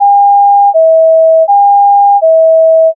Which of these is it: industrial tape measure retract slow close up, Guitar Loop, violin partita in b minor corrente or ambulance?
ambulance